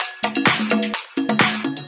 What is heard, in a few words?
Percussive Loops 128BPM; Vengeance Samples